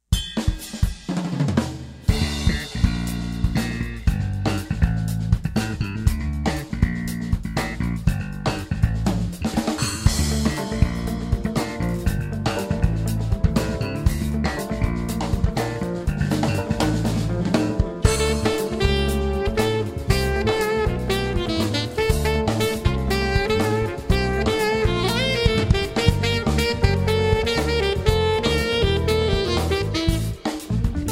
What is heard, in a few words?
Funky Train